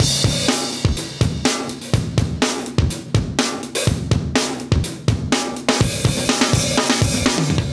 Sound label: 124 live breaks